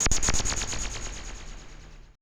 AMBI FX

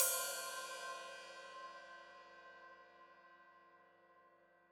Hat Open, Hi-Hat; Cymbals Stereo; Deeply Sampled Drums

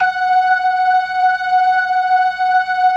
LO MANUAL VIB, Organ

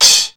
CHOKE; Hollywood Edge; The Big Bad Beatmachine; XR-10

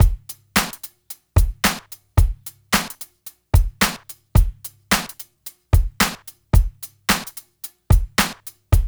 DRUMS, Funky Ass Loops; East West

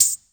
tambourine

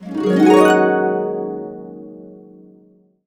Complete Harps; USB Soundscan vol.62; AKAI

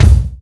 KIK; Greytsounds VOL-1; SDX KICKS

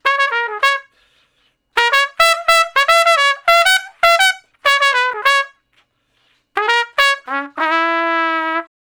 Trumpet Shuffle (Db); Crimson Blue and Fabulous Horncraft 4 RnB; Horncraft for R&B; Solos

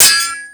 SW HIT, sword hits; Total Control - Action Cutz

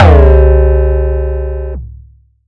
Perc

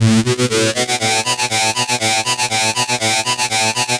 Progressive Synth, Trance

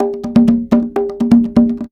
Percussion Loops, Bongo & Conga; Houseworx

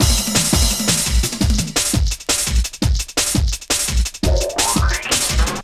Swift Beat, Drum & Bass, Beats & Breaks; Fluid Dynamics

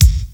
KICK, DRUMS; Crypton - DrumBase5000; CD DRUMS #2

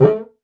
technox perc; Quasimidi Technox

